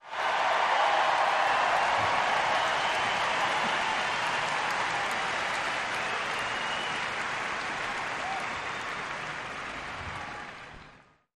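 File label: Crowd 50000 Whistle, large int; Crowd ( 50, 000 ): with Whistles / Applause, Surge / Dist Drum In Background. Cheers Crowd, Huge.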